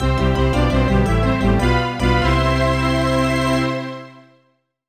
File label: Fanfare Repair; nintendo ds; Disney Fairies Tinker Bell